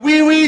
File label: notification